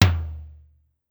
Tom Mid Hi, Drum Machines Clavia DDrum-AT Pitched Down